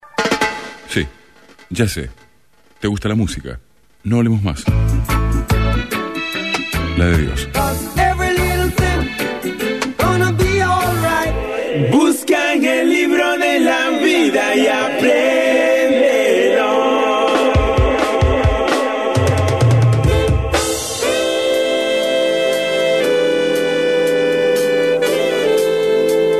Reggae

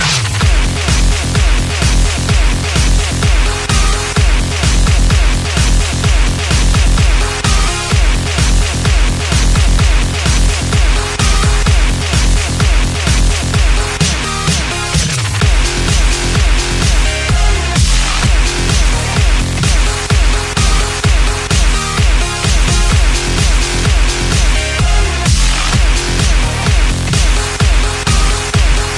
NEW DUBSTEP

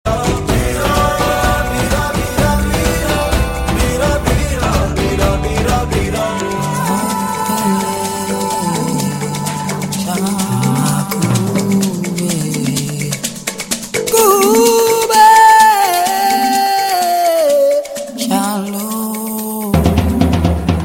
Bass Tone

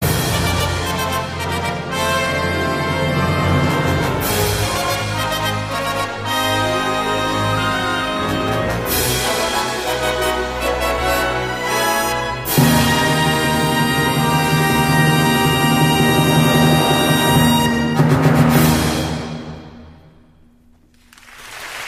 Fanfare; ALTTP

